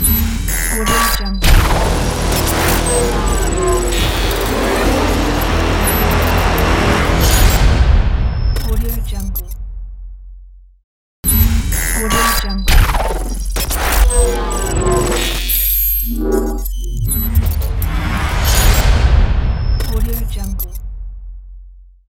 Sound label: transforming logo